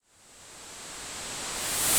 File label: reverse cymbal; Misc Percussion